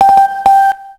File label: Movie Start, Camera; Motorola PEBL VU20